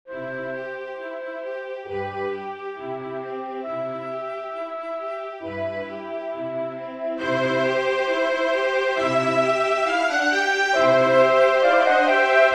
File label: sinfonia